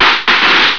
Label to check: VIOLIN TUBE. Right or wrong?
Wrong